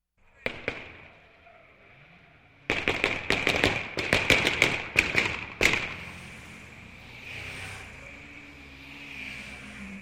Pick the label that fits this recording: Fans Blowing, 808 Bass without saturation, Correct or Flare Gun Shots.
Flare Gun Shots